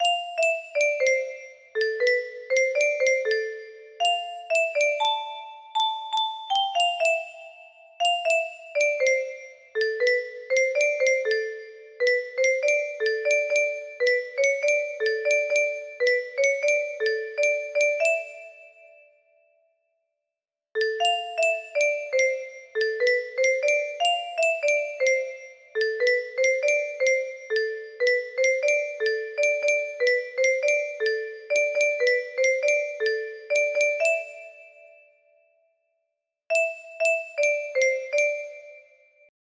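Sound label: music box melody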